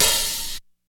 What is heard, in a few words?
Big Open Hi Hat Sound G Key, Royality free open hat tuned to the G note. Loudest frequency: 6136